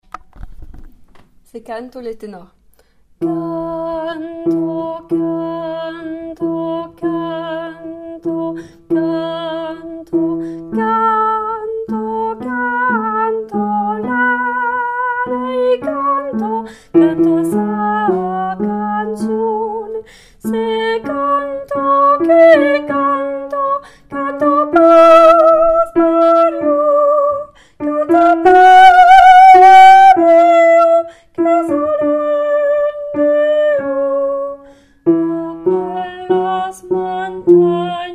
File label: canto tenors